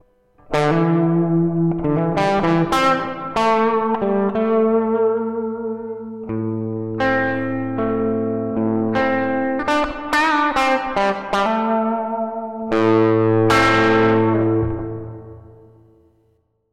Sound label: infinity reverb; parish audio